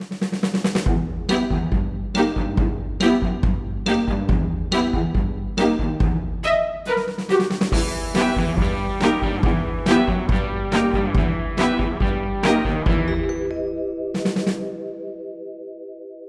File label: Music Combat Summary Screen